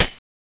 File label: L TAMBRIN; CMI